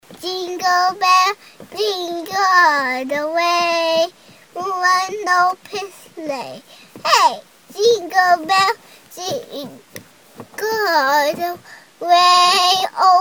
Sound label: jingle